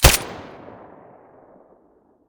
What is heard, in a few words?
silencer glock17; weapons